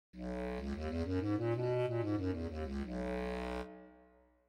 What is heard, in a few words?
bass clarinet; garritan; sibelius sounds essentials